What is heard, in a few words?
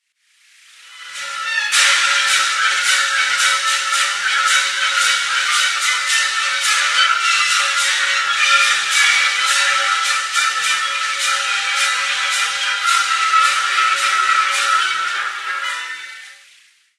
ldv recording deg 90